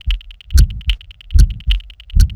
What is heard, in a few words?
adrenaline loop